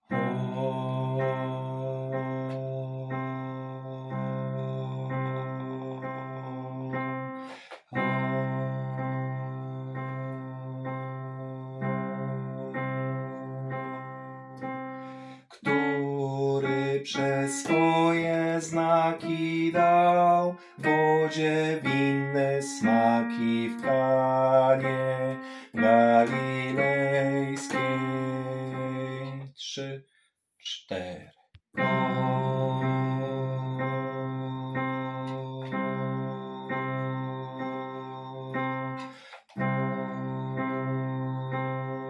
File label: Bass; mesyasz przyszedl; nagrania glosow warsztaty2026